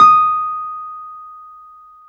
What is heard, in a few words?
PNO, Wurly